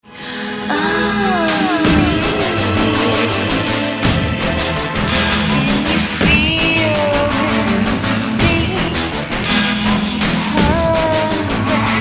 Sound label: distort